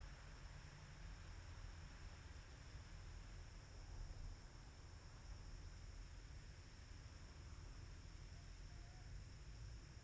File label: yamanakako sound